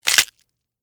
Crack Crunch Celery, Texture, Crack, Crunch, Celery; Food; Household; BlastWave